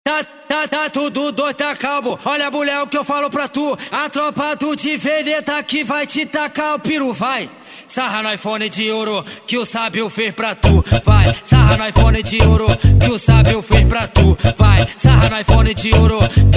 funk ritmado; cuiaba mt

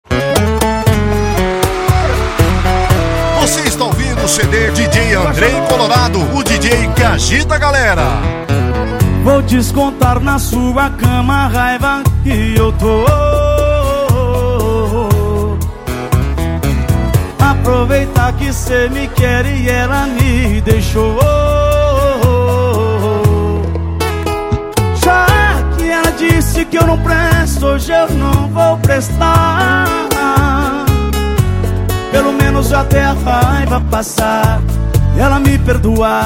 sertanejo com locutores de rodeio

